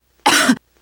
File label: cough, teengirl